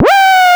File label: Synth Sound; Vengeance Samples; VEE Synth Shots